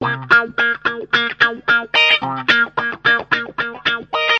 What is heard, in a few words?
60s guitar rhythm; SAMPLED MUSIC LOOPS